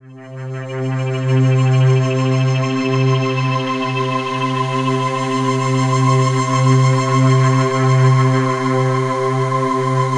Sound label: Atmosphere C